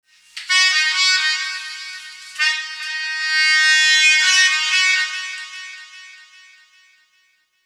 NEW ROOTS REGGAE; Bass; Sonic Foundry (Sony Creative Software); Not Just Another Pretty Bass

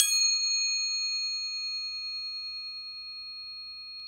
Crotales, Orchestral Perc

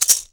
SINGL RATTLE; Best Service; World Colours

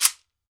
SHEKERE, African Percussion; E-MU Producer Series Vol. 7 – Old World Instruments

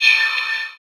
A Syn, Chords Tones; Equipped Music; Loungin House

